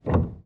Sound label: piano